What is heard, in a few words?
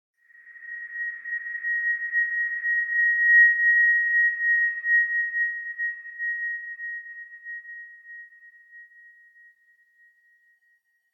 sonar Ambience Beacon; Barotrauma; Real Sonar